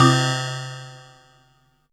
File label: Synth Bells; Dream Zone